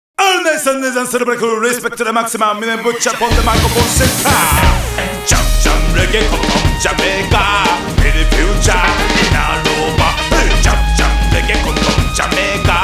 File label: Reggae SWING MIX; Dance Dance Revolution 3rdMix